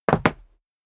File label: step sneaker concrete jump